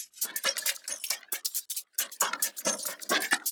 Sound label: loop bpm 136